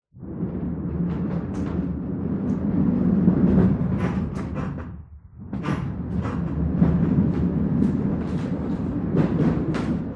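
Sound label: portable blackboard roll across floor classroom ambience various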